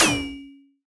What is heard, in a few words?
Alien FM Perc Med; Ableton; Noise Garden - Augmented Percussion; Alien FM Perc Vol. 1